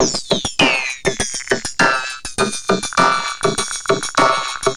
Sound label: Vocobeat; Sonic Foundry (Sony Creative Software)